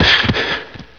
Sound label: fall pain, player; diekrypta